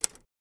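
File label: Typewriter Single Key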